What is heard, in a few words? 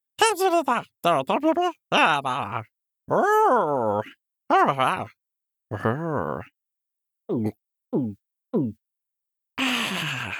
Creature Monster